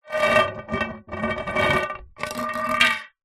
foley tin small empty no lid movement on hard ground; zapsplat